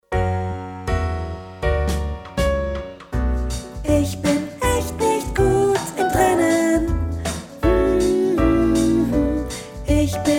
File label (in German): Sopran